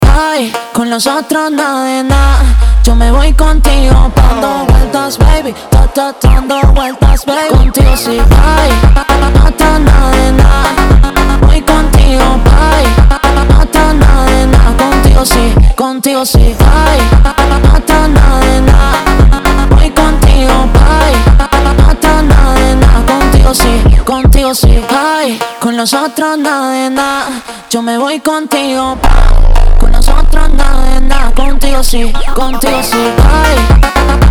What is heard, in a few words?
Slowed